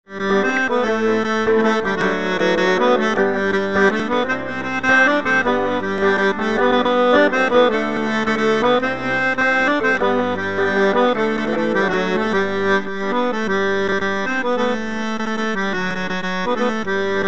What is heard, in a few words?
Mazurka